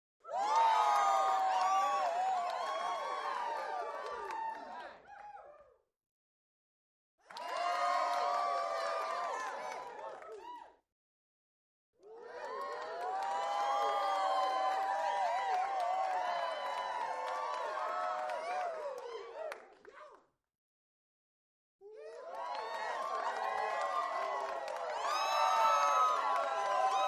Crowd Int Short, small int

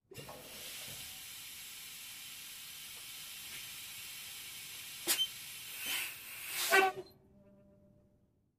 Truck Semi Air Brake; brakes

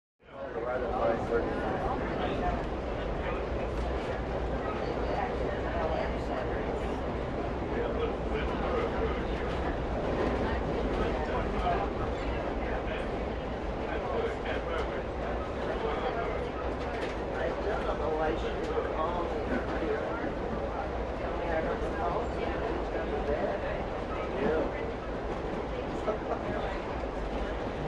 Train Interior Passe

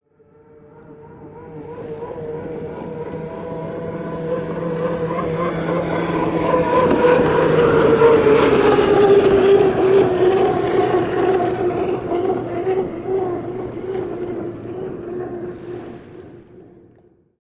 Hydroplane By Water

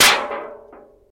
Aluminum Siding Impact, Warble

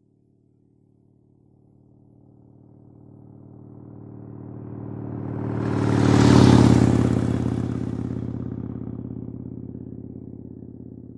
Harley By Medium Deep, harley davidson

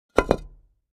Cinder Block Two Slam

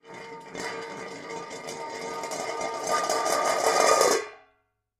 Hubcap Spin On Cement

